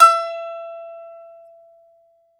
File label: BAGLAMA E0JR; E-MU Producer Series Vol. 7 – Old World Instruments